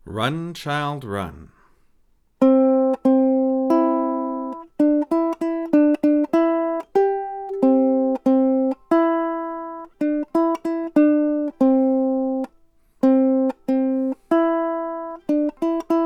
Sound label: Run Child Run, ukulele